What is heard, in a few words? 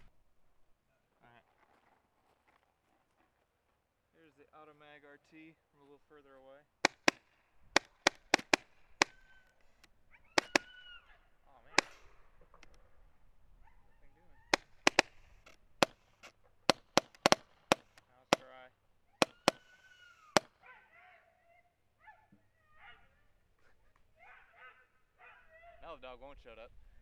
automag shotgun no clip, guns; automag rt